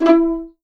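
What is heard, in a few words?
E4 pizzicato forte left, violins; East West; The Ultimate String Collection; VLNS PIZZ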